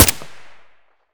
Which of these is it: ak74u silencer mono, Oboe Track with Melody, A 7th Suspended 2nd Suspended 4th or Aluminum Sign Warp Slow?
ak74u silencer mono